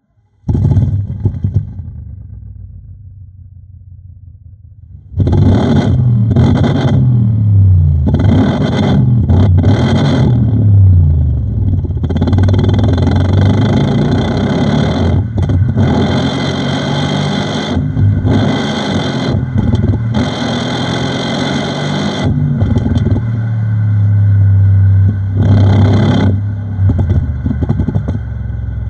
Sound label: Audi rs7